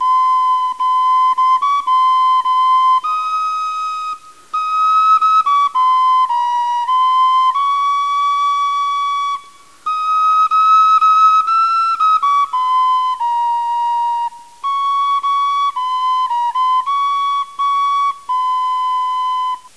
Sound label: Flute; Voluspa